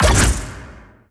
magic skin

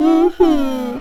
scared whimper

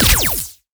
lightning medium; redlightning

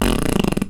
fart

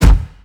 enemy appear smoke

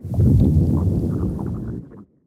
growl large; fistula

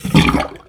sink drain gurgle

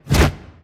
bighorn hand hide

